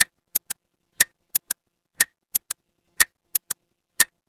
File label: mechanical clock tick